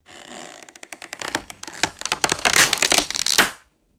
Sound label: wood creaking and snapping under stress